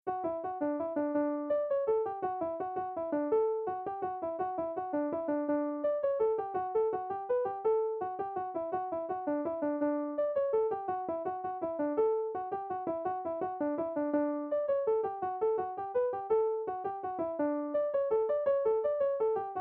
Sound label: session, irish music; rolling waves